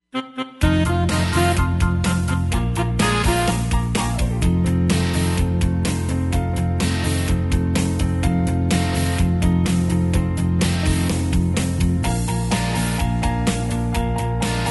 happy dance rhythm track, square dance

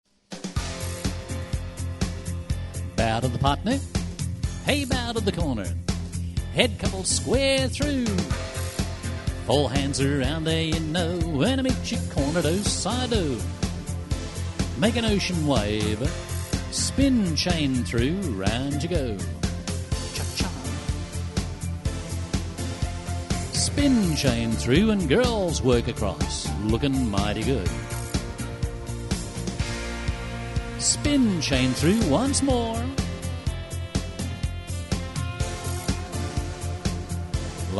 hoedown vocal

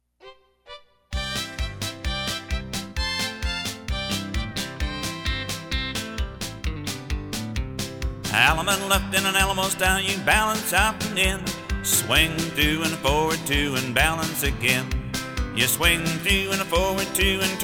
vocal, square dance

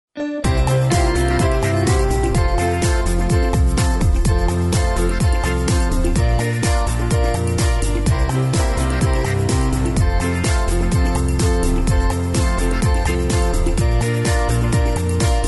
rhythm track

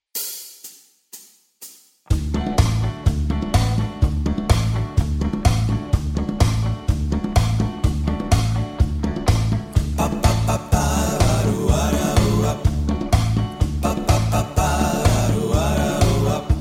cajun rock, square dance

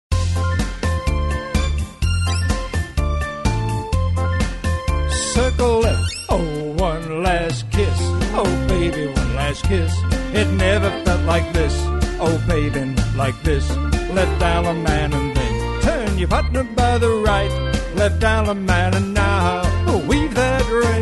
vocal

